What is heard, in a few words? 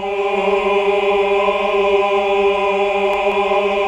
AAH; Best Service Gigapack 1